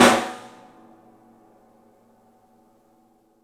STEEL, Drums, ROOM SINGLE; 3D Sound

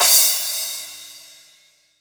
16 inch, Crash Cymbal, mono crash

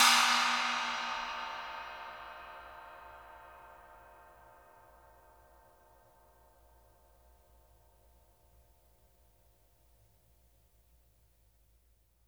PANG STICK, cymbals; Sampleheads; New York City Drumworks VOL-1

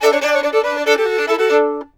fiddle, 140; Sampleheads; Whole Lotta Country 1 & 2

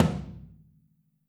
FLTM OH L, Kit OH Mic, Drums Percussion

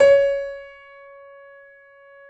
Db4, YC7 piano, mf; Roland L-CD701

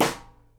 SNARE, Live Snares

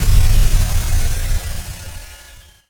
FX Hit; One Shots; Drums & Drones

